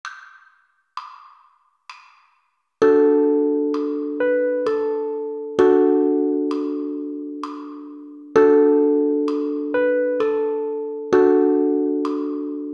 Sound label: D Major Easy, uke